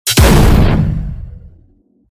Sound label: enemy explosion; neon harrier